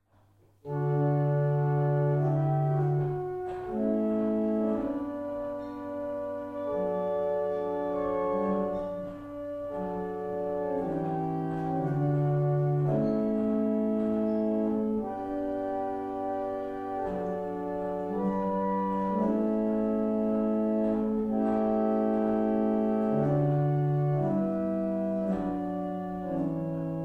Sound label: Double chant, barrel organ; Sutton Beds; Mornington